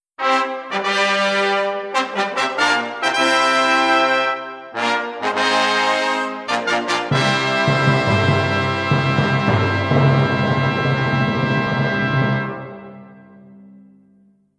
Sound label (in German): burg fanfare, Klingelton; NÜRNBERGER Burgfanfare